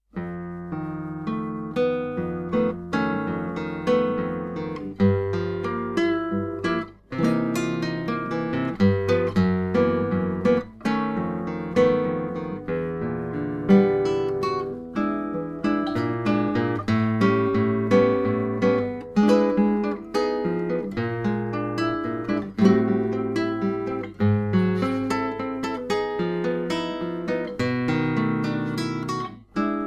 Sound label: jazz blues